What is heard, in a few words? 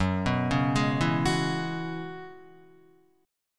Gb 7th Suspended 2nd Suspended 4th, Guitar; arpeggiated; JGuitar